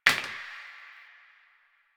Plastic Broom Drop; Warframe; Ambience; Nineteen Ninety Nine

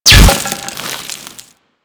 Bolt Impact Stone; Warframe; Weapons; Cross Bolt

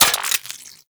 Spear Gun Reload, Weapons; Warframe; Corpus

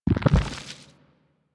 Nunchuck Pulse, Weapons; Warframe; Infested

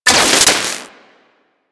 Split Laser Beams Join, Weapons; Warframe; Corpus

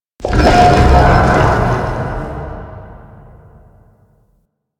Search Lights Growl; Mission One; Warframe; The New War

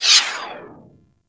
Skate Stride; Warframe; Corpus Modular Crewman; Skate Movement

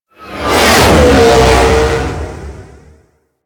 Ship Close Short Fly By, Engines; Warframe; DE; Vehicles; RailJack; RailJackEnemyShips; Grineer; EnginesGeneric

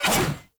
Sword Whoosh Heavy; Weapons; Player; Swords; Garren Sword

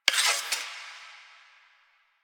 Choir Bow Load Arrow; Warframe; Weapons

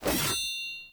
Two Handed Axe Whoosh Stab; Weapons; Enemy; Axes; Sinecure Two Handed Axe

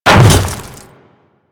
Hammer Boss Land Ice, Footsteps; Warframe; Grineer

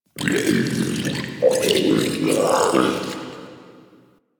Interior Munching; Ambience; Misc Indoor; DE; Warframe; Infested Eris

